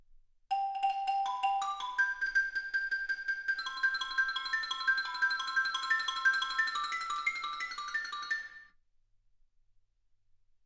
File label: xylophone